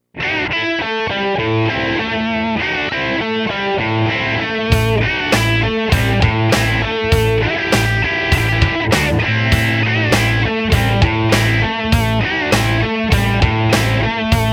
Rock Bites, rock

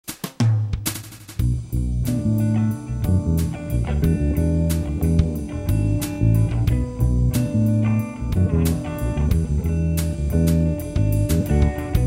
End Music, soul and funk

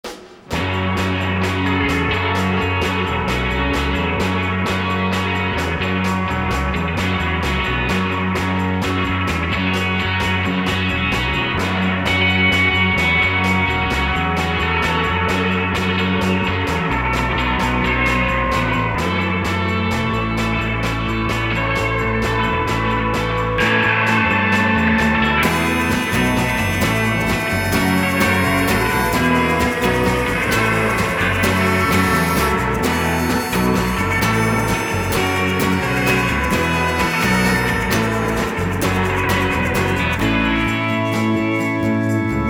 Audience, rock

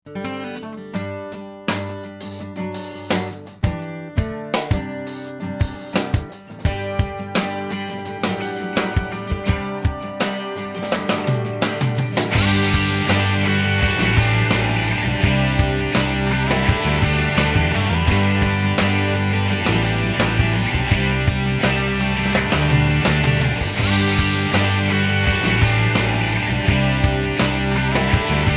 Truck, rock

